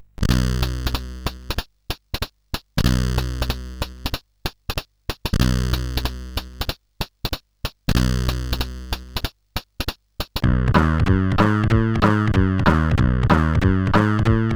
Engine sid CSG8580R5

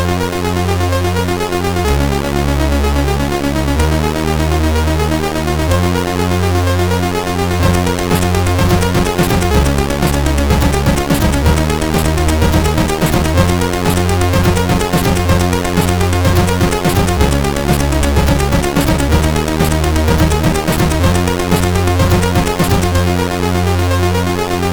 Intro, MOS6581R4; Intro 2; Fate; hvsc

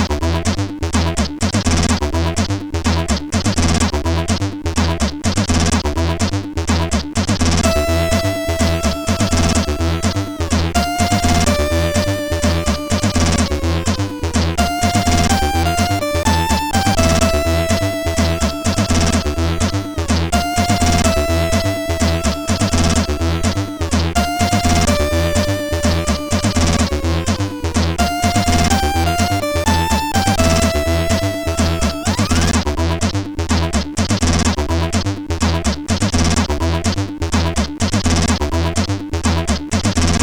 Cracktro, CSG8580R5; hvsc

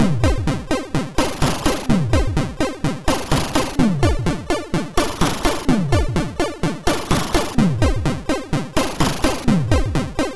Rap, sid MOS6581R2

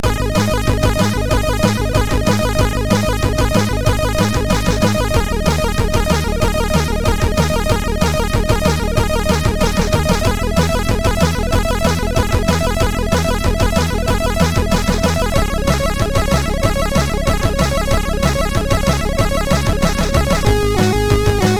Basic tune, sid MOS6581R4

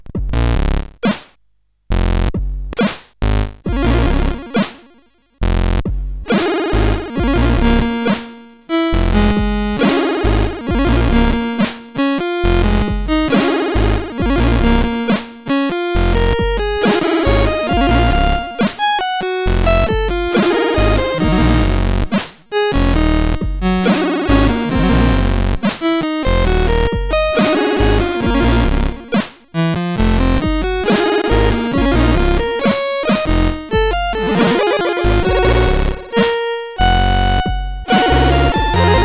Mini Blues, CSG8580R5

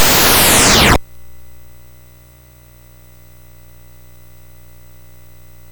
MOS6581R3, GAMES; Spikes Peak

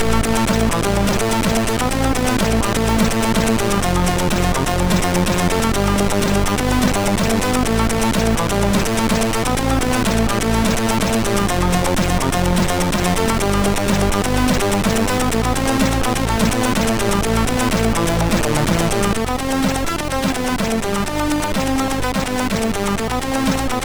tune, MOS6581R2; No Single Pixel Raster

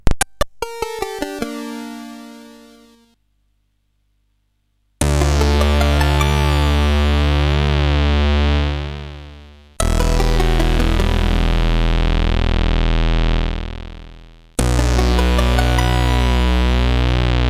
Metal sid CSG8580R5; Nintendo Metal

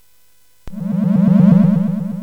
Dark Castle, CSG8580R5

